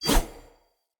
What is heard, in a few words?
Dagger Whoosh; Weapons; Swords; Fey